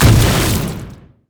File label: landslide; reef malphite